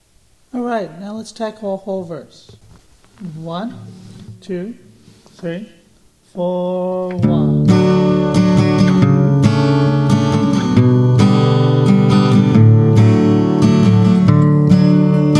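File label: open tuning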